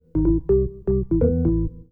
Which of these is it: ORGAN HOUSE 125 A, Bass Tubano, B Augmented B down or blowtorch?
ORGAN HOUSE 125 A